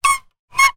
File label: wood pole turn squeak; foley; zapsplat